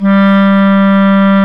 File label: Clarinets